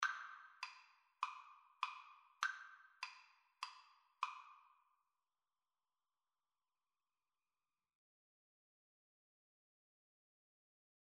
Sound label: ave maria, Violin Cello Duet; gounod